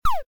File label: pew; Mindustry